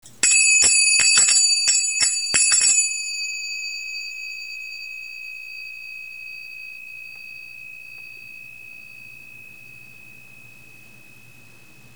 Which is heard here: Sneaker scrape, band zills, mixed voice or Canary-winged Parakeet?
band zills